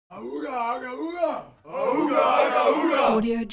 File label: human crowd native american shout; smartsoundfx